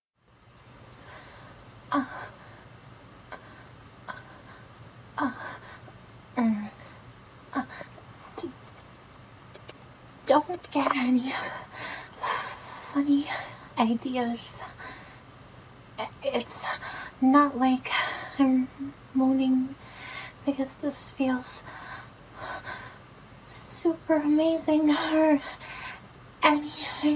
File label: Tsundere Moaning